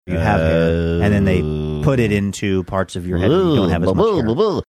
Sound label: making funny sounds